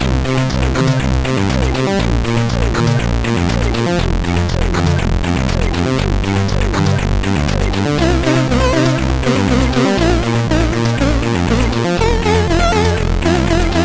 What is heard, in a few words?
Nice Tune sid CSG8580R5